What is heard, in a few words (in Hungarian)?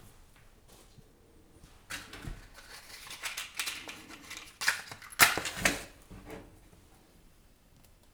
lángra lobbantás, gyufa; Perkupa